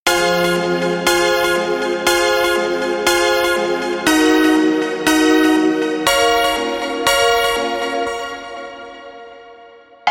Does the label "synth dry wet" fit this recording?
Yes